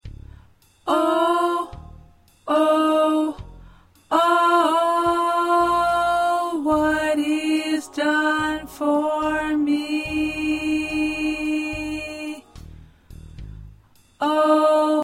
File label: 2nd Alto